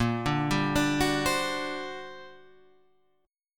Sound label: G Minor Major 11th, Bb